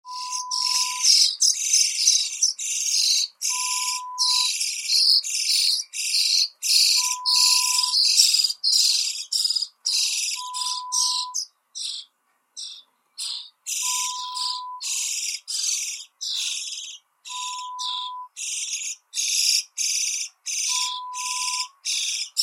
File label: Birds Nest Sound effect